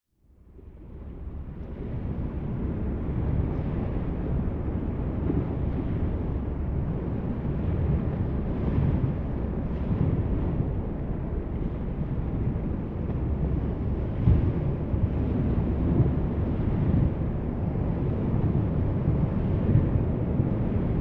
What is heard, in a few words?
Underwater Ambience Shallow - Rough Ocean Waves; Hollywood Edge; sound designer's toolkit v2